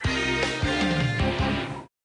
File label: Scene Transition Effect; Armada